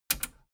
metal button radio click enable fast heavy

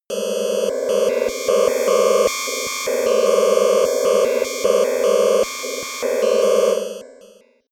atmosphere on off

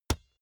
basketball single hand catch hard

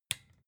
desk lamp on soft

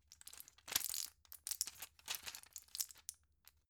plastic blister handle long

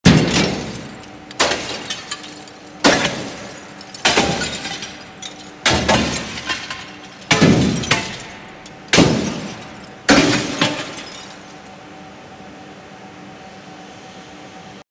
glass breaking

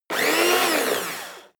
servo rev up short

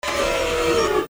servo noise

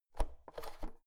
oven knob press in soft

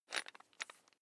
electric drill bit fasten wrench turn fast

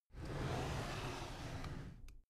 drawer cabinet carton close soft

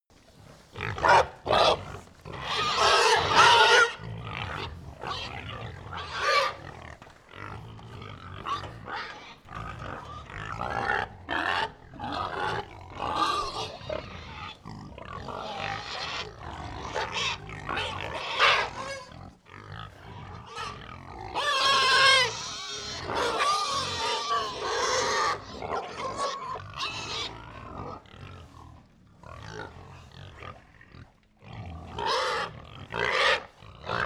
pig herd grunt, pig

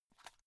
watch case small open quick

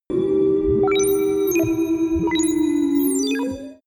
facility compute polyphonic

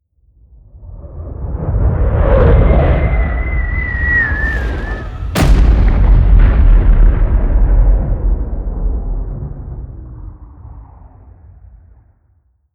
air strike

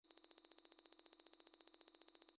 title running layer signal

